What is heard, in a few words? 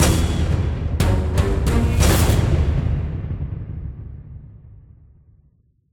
Combat Boss End